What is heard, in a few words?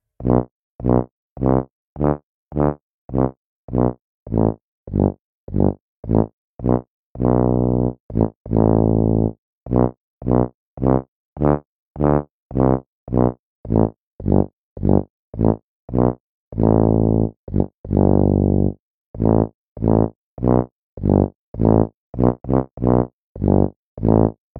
Tuba